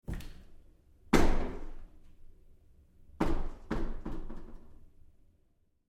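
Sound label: Door Bathroom Stall Open close-up Med with Bounce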